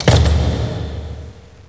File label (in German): Tür zu